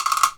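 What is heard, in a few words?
WOODBLOCK; ILIO; Synclavier Percussion 2; World & Orchestral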